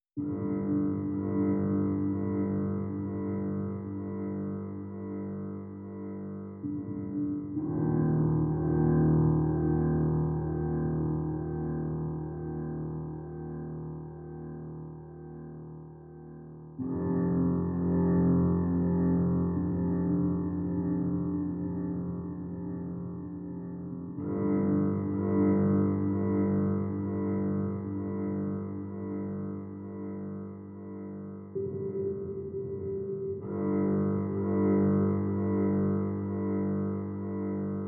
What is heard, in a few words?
Exploration General Piano; Horizon Zero Dawn; PS4